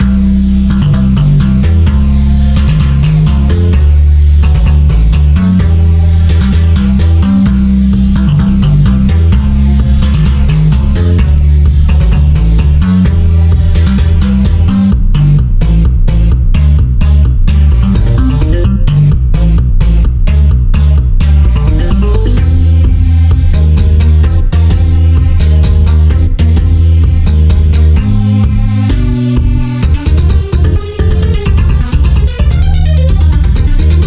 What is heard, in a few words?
synth only; unununium; willow; nockmaar2